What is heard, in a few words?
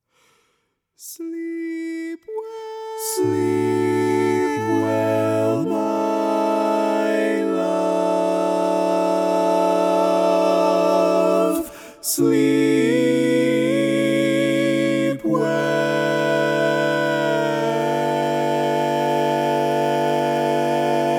barbershop tags, all parts